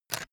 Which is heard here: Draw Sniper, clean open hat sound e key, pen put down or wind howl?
pen put down